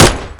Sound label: 30cal; WAR